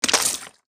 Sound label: splash; water splash slime sfx